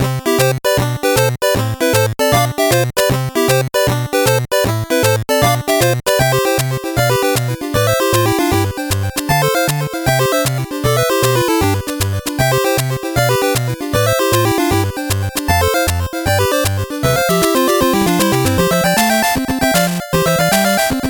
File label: 8bit bgm fast